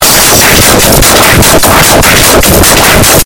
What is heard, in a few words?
hard bass